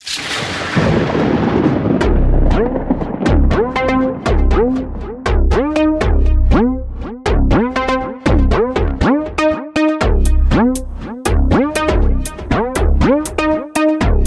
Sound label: BASS; BAD BASS